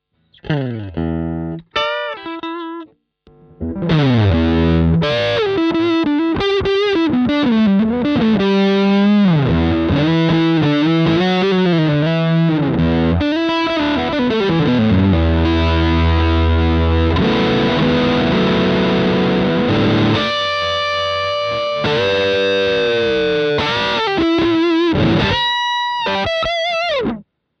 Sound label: Gain mid LEAD; BM20 ULTRA